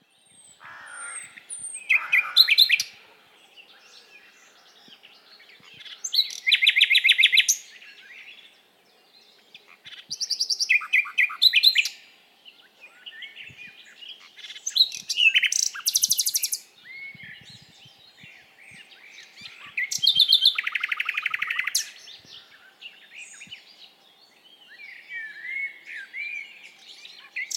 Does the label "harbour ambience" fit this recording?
No